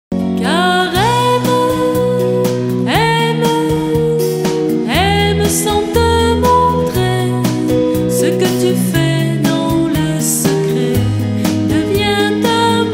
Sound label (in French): Car aime refrain